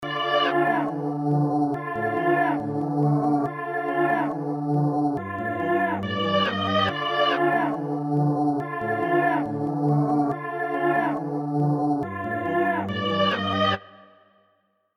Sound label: Luxury Sample